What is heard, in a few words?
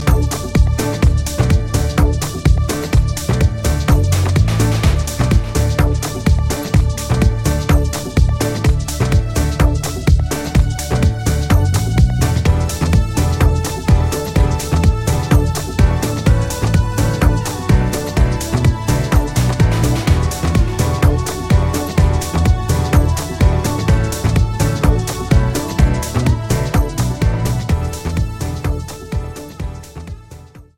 REMIX INSTRUMENTAL